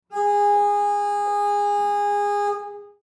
Boat Horn Single; Boat Horn Honk; Transportation Watercraft

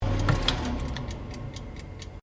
Oven Fanforce Shutdown Door Closed; Common oven sounds; Appliance; Household

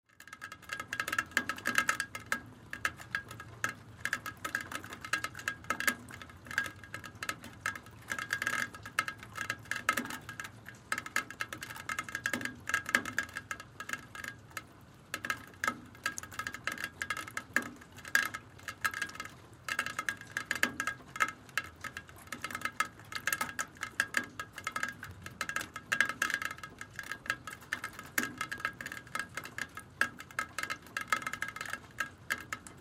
Rain Gutter House Drip Windy Neighborhood; Weather; Ambience; Weather Rain House Attic Drips Horror Loop; Blastwave

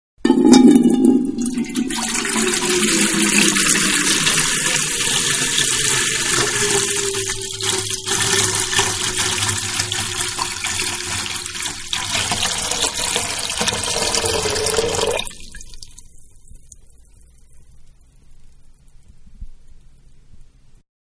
Pour Into Metal Pail, Water; Pouring; Manhattan Production Music

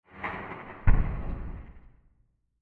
Diffuse Misc Transition Ends With Soft Explosion; Scary Soundscape; Crafted Signals

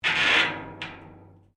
METAL RUSTY SCRAPE; Metal Sound Effects; Metal (Sound Effects:Materials)

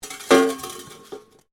METAL PIPE FALL; Materials

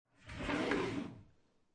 Household, Drawer Open, Wooden, Kitchen Drawer

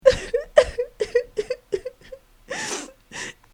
Woman Crying; Human

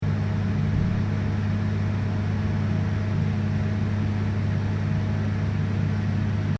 Fan (Extractor): Running; loop; Fans; Electronics; Stuart Duffield

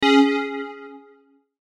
SYNTH STAB, Stab, Dark, Distortion, Digital; Sound Design; Multimedia; Production Element, Imaging Element, Accent, Transition; Blastwave

